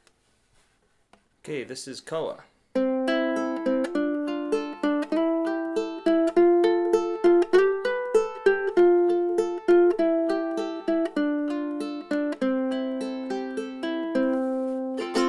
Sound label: black Stripe Koa, ukuleles